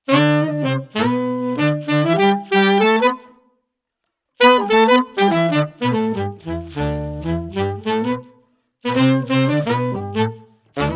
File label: Micro POG, Octavers; Saxophone; Pitch Manipulation Pedals